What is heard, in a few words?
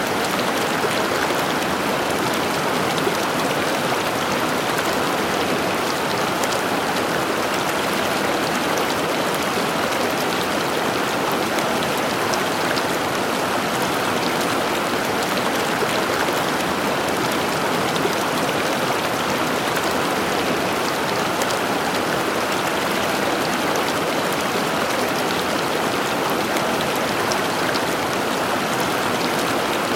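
powerful jets of waterfall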